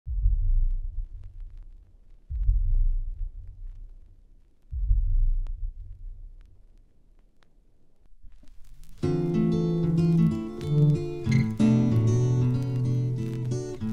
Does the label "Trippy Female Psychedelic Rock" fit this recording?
Yes